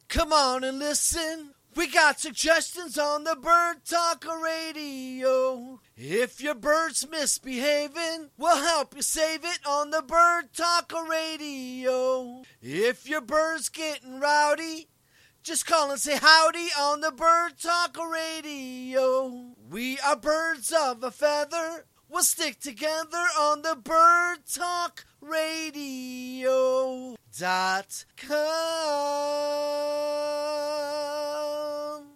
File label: Jingle ACAPELLA